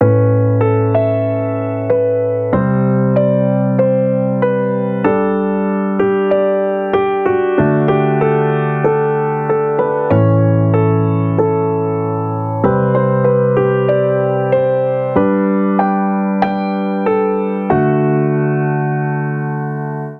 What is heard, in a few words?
Unique Melody A Maj (F# Min) 95 BPM; ultimate vibes midi pack 2